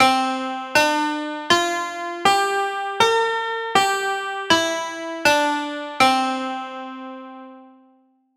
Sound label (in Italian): Pentafonica 240